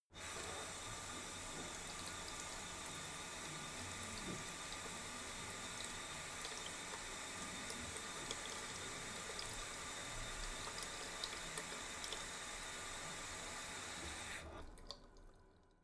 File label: tap flow light long; haus garten; badezimmer